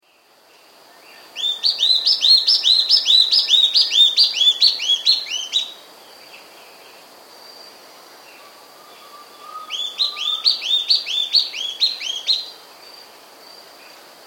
Spot-Backed Antbird; S. America

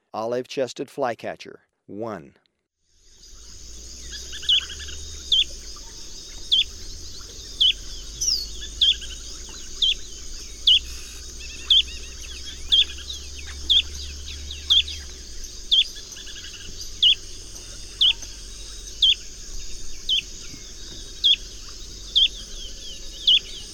Olive-chested Flycatcher, Birds of Ecuador; S. America

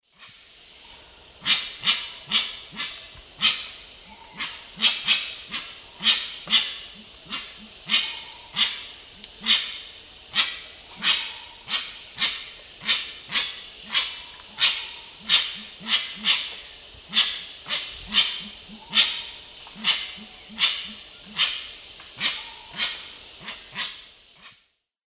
Psophia crepitans, calls; S. America; Eastern Lowlands

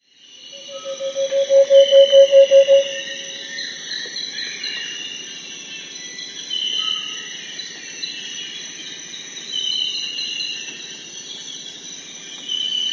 Variegated Antpitta; S. America

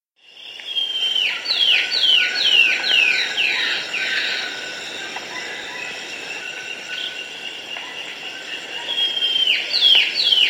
Harlequin Antbird; S. America

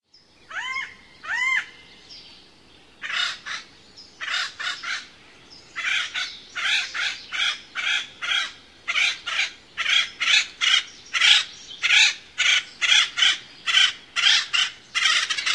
Acorn Woodpecker, Bird Sounds; North America; Common Western Species; Know Your Bird Sounds

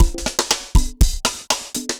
dancefloor perc 120 bpm; loops

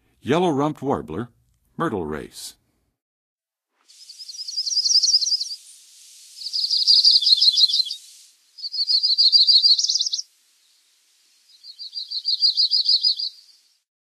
Yellow-rumped Warbler Myrtle; North America; Western USA; Western USA Songbirds

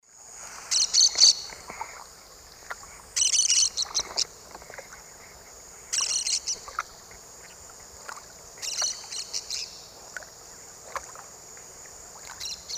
Brotogeris cyanoptera, flight calls; S. America; Eastern Lowlands

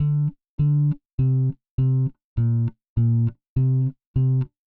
Jazz bass, Rock Bass

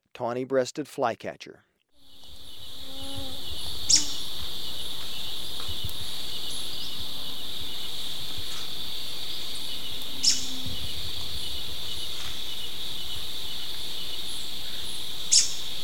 Tawny-breasted Flycatcher, Birds of Ecuador; S. America

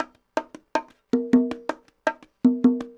cong bongs 80 bpm, latin

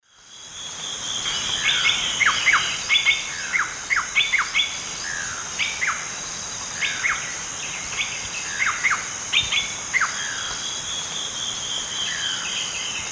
Cacicus sclateri, song; S. America; Eastern Lowlands

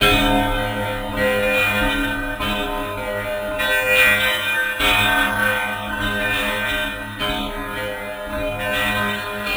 Water Guitar; Electronica

